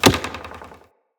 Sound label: Bow Arrow Impact Wood; Weapon Impacts; Player; Bows; Environment; Hunter; Envoy Hunter Bow